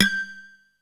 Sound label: PERC G5; SEQ AND PERC; Music Store - Claudius Diemeter Schwerlos